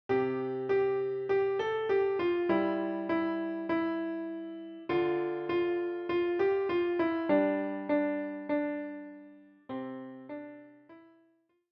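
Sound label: piano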